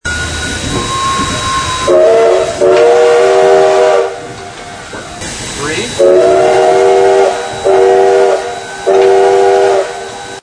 WHistle, steam whistles

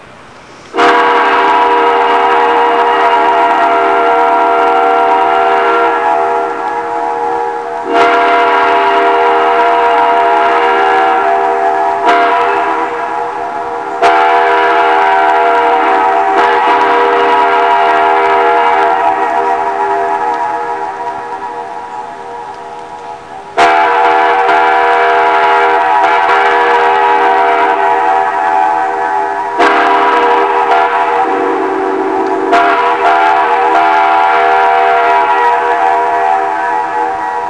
C&O 6chime, steam whistles

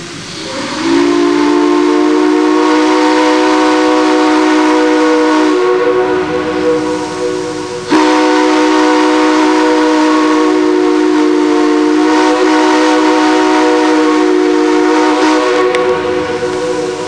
3chime, steam whistles; C&O

